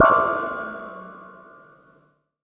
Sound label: Simple OSC Spring Reverb; radiosonics; Classic Simple OSC FX